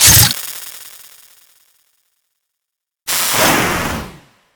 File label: Magnetize Shorter; Archwing Intro Cin; Warframe; Cinematics